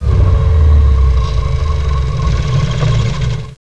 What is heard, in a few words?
Engine Shut Down; Virtual Wings Demo